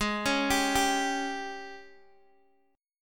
Ab Major 7th Suspended 4th, Guitar, Open G; down

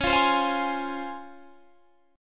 C sharp Major 7th C sharp, Guitar Standard; strummed; chord; 21,20,22,22,21,20

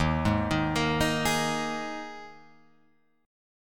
Eb Suspended 4th, Guitar, Open F; Ebsus4 arpeggiated; 3,3,3,5,6,3; JGuitar

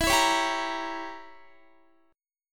E Diminished 7th E, Guitar; Edim7; strummed

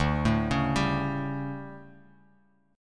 D-Major 7th Suspended 4th, D, Guitar, Open F; DM7sus4 arpeggiated

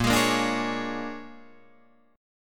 Bb 7th Sharp 9th Bb Guitar Open D, chord

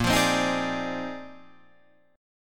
Bb 11th, Guitar, Drop D

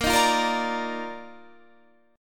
Eb Major 7th Suspended 2nd Bb

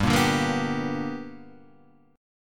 B Minor Major 7th Sharp 5th

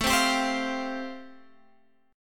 A-Minor 7th Sharp 5th, Guitar Standard, chord; x,12,10,12,13,13; Am7#5 strummed; JGuitar

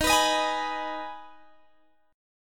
D sharp Suspended 4th Sharp 5th, Guitar, Standard; strummed; JGuitar

